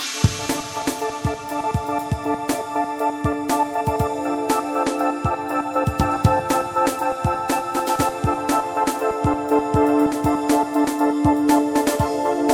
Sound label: smooth chops with dangerous drums; filter sweep synthesizer rhythm rhythmic dreamy dancey complex